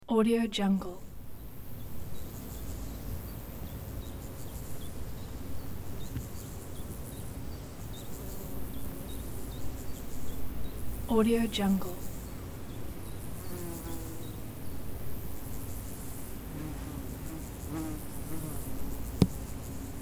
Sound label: wildlife insects birds summer